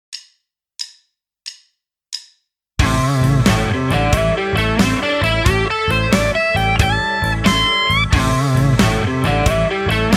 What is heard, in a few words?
pentatonic blues